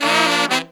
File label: 132, Pop & Funk Brass